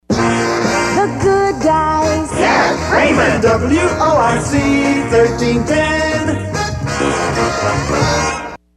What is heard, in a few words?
1960s radio jingle; GOOD GUYS